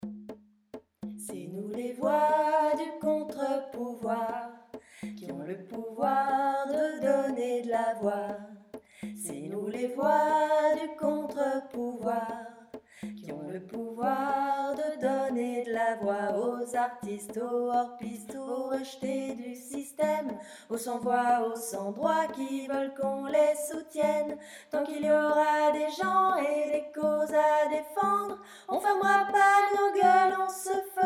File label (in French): Voix 3 Haute bourdonnante; Donner de la voix